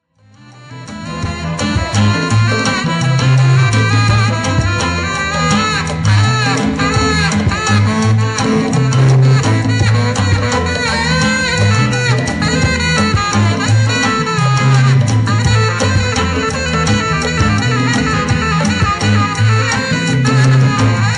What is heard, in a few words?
solo sax; salsa